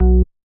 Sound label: bass, programmable speaker